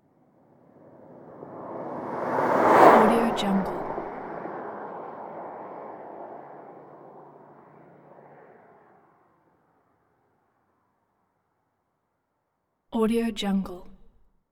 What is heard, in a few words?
compact car pass by 100mph